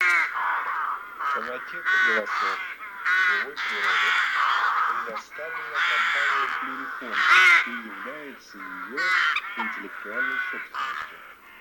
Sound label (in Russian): Грач Corvo nero; plurifon